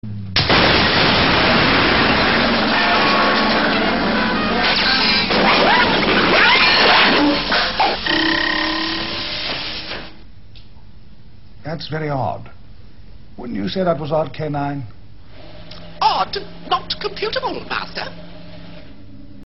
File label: Whizz Bang